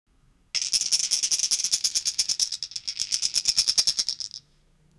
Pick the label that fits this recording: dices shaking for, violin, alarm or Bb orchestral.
dices shaking for